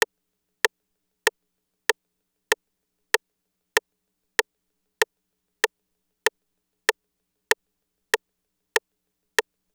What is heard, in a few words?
TR-808 Rim Shot; Roland; Instrument samples